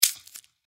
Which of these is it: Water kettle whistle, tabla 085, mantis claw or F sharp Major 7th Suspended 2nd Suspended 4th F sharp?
mantis claw